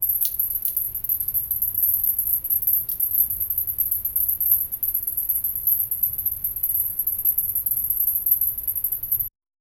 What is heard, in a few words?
crickets chirping water d